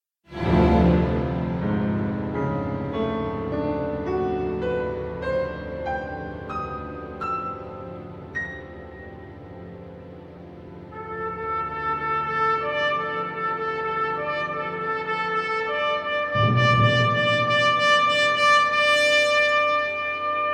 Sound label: Two pianos and Orchestra Largo; Concerto